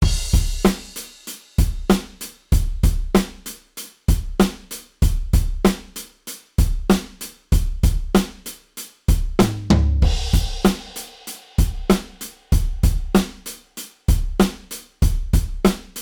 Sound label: modal surf, drums